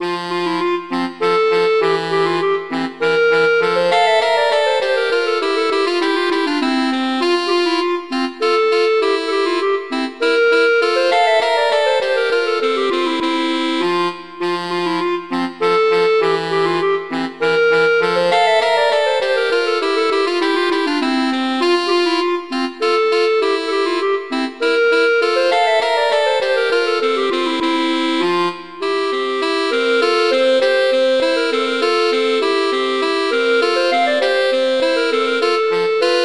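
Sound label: Duet, horn; Mozart; Duets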